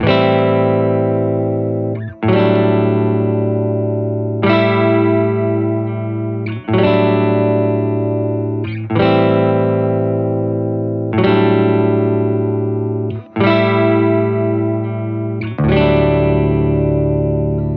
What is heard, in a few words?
Lazy Ballade; healing soundscapes